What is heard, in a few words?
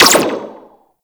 Sentinel Laser Rifle Fire; Warframe